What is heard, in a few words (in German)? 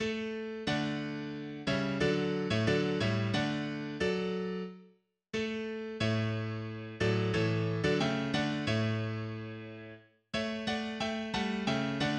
Klavier